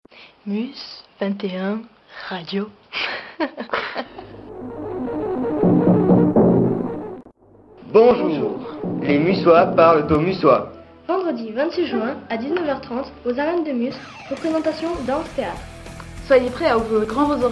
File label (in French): jingle théâtre